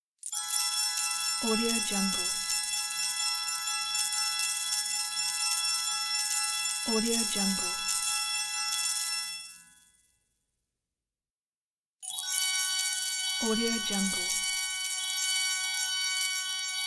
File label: winning coins